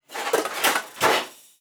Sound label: bunch of pots; nova sound